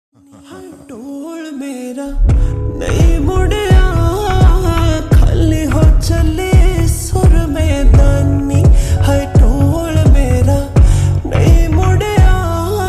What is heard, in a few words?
Slowed Reverb Ringtone